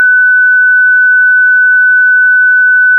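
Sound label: a gentle short mid range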